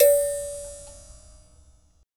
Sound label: Bell, World Percussion; Sony CS